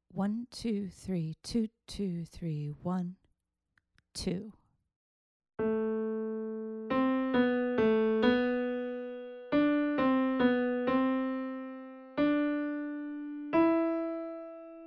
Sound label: melodic dictation